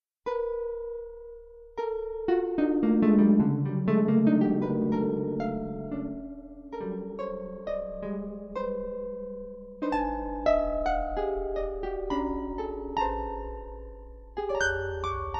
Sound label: Arpa